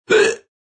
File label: Hiccup Crackling, Male hiccup, cracking, (human voice); Hiccupping Sound Effects; Part of Hiccupping (Sound Effects:Human)